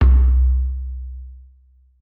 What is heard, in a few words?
reels start; Butterfly Lovers; wazdan